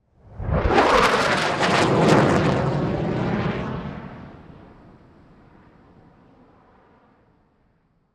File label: B1 Fly By Fast, Airplane; Airplanes; Vehicles; BlastWave